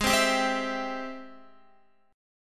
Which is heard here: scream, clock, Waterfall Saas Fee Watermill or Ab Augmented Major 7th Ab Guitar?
Ab Augmented Major 7th Ab Guitar